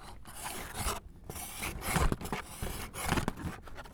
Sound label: Pushing Plastic; BB Retail Therapy Sample Pack